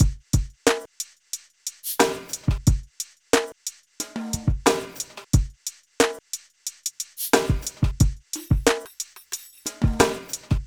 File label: Drums, Hip Hop